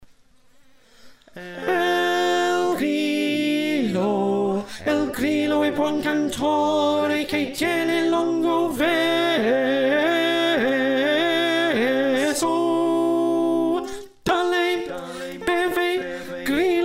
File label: Alto